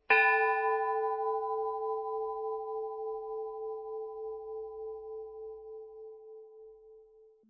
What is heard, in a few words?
russian bells; zvons